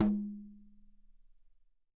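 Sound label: Yamaha 8X8, brush, drums; Big Fish Audio; Ross Garfield Drums VOL-2; T-YAM BRUSH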